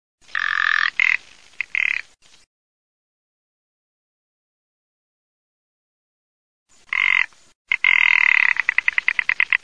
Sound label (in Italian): PERNICE BIANCA